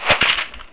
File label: clap slap